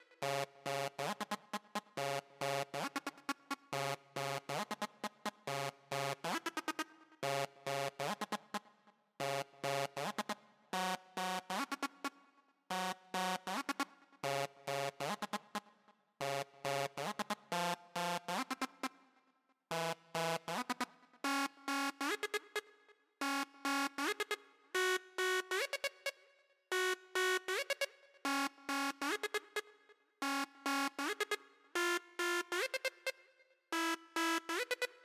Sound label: Breakout Psychedelic Mix Stem Layer; Breakout Recharged